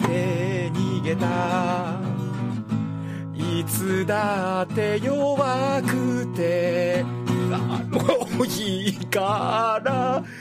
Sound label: cough